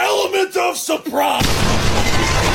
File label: element surprise boom